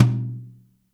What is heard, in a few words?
TOM H2 SFT R; Zero-G; Chemical Beats